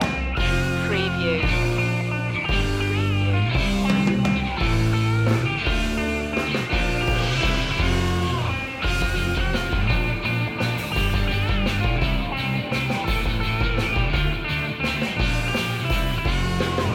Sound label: we wish you a merry christmas rock loop